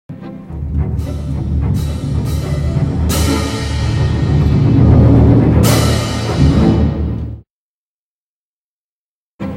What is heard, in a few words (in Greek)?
Γκράν κάσα Κύμβαλα; Η οικογένεια των κρουστών